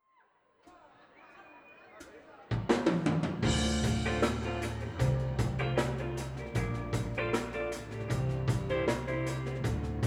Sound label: trumpet processed